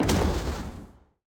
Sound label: Aoe Push; Folk Hero